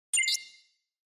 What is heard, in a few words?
Cyber